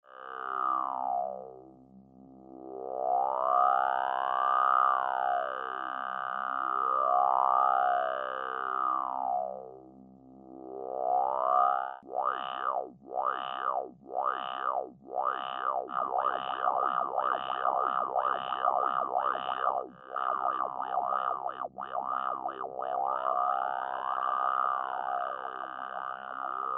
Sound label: Gregory chants